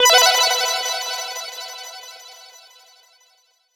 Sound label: Transistion Retro GameStart; Vanguard V